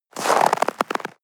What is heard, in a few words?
step snow walk single